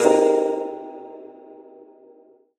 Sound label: SOUND (COFFEE BEAN), FX